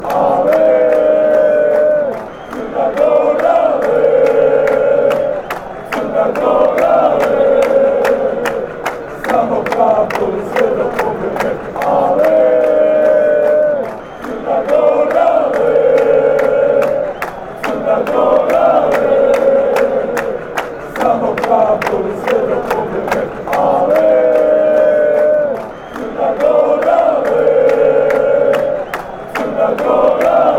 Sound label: Montenegro chant